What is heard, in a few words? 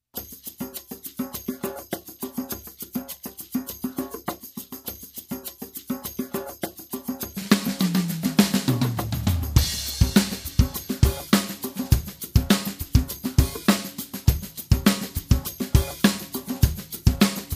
Grooving with Loops